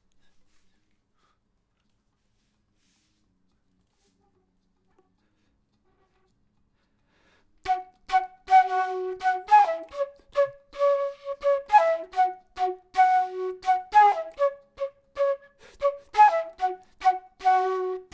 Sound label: flute intro; logic